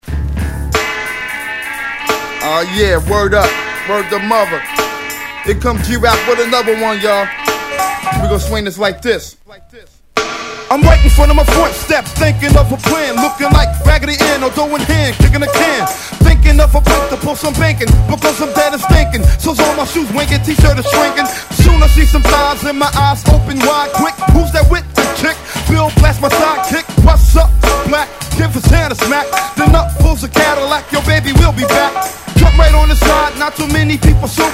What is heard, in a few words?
Blues